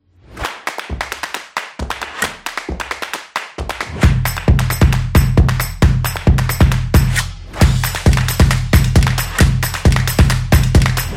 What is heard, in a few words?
Typo Drums; percussive nation vol 4